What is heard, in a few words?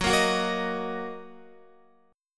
Fsharp 6th down Guitar; F#6; strummed